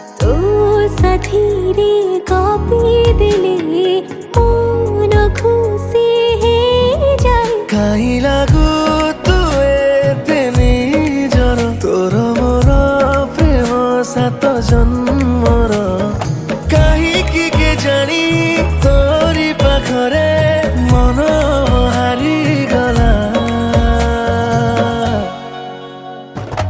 Romantic Song

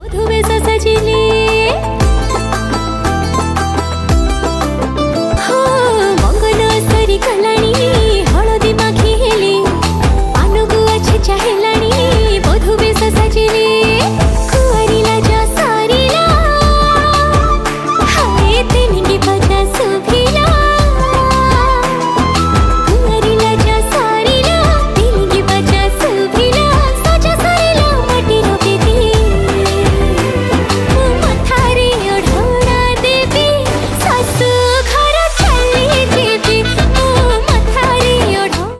Odia Song

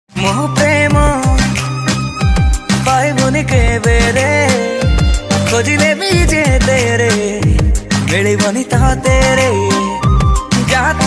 Odia Sad

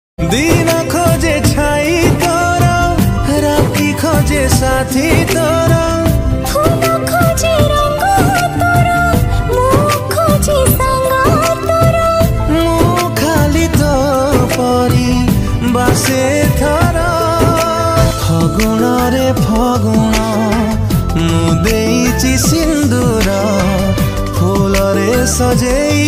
Odia Romantic

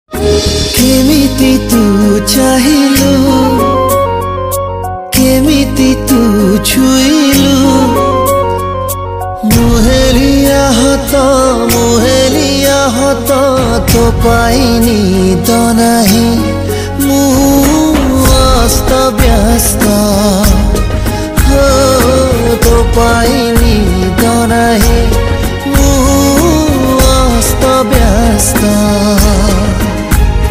Odia Romantic Music Song

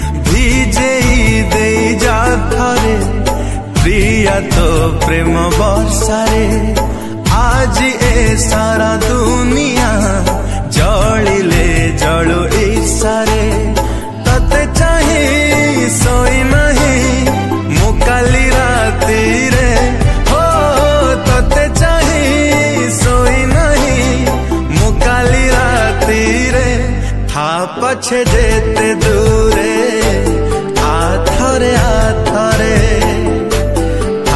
Odia Song Ringtone

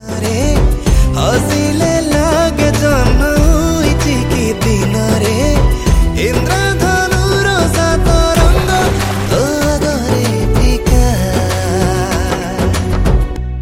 Odia Romantic Song

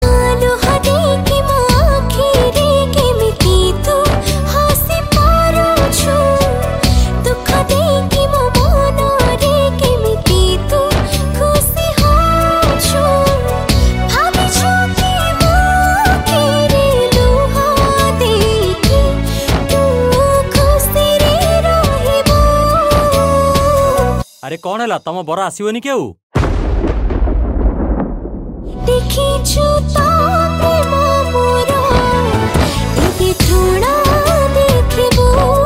Sad female

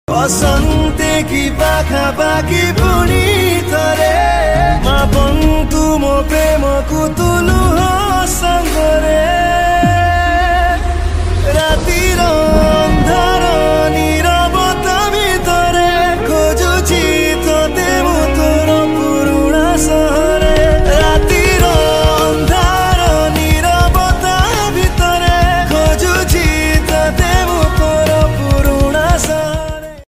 New Odia Sad

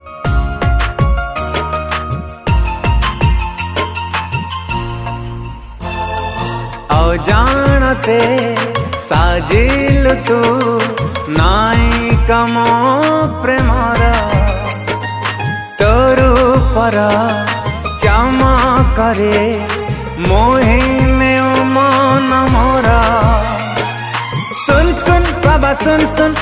Odia Music

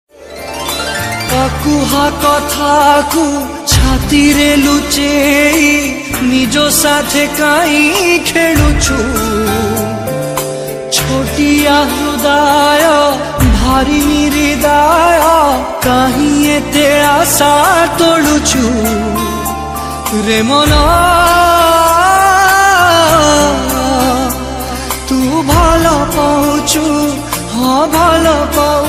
Odia Love song, Odia Ringtone

